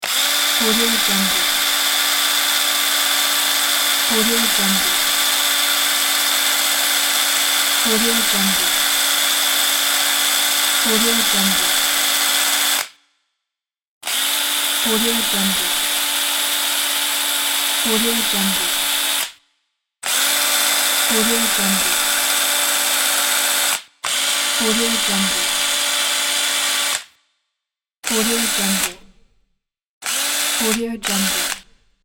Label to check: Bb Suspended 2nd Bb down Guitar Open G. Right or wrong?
Wrong